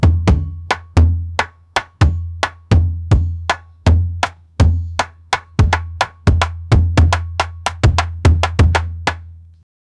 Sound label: nepali ethnic drums